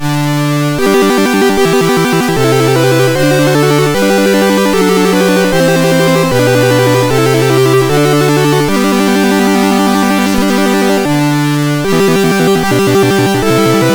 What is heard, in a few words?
Wilhelmus, sid MOS6581R4